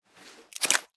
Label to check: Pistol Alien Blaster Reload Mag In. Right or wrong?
Right